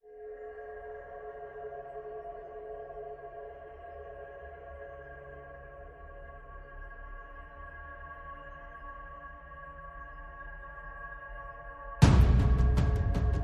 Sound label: Drums Only